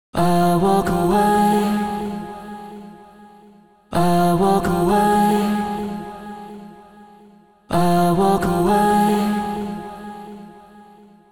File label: Vocals; multitrack